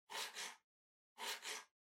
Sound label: PC Mouse Move Far Short